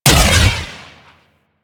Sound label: Explosive Impact; Weapons; Warframe; Sapient; Sapient Primary